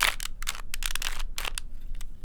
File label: Small Cube - Twist, Rubik's Cube